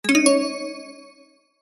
multimedia game sound mobile game warm positive tone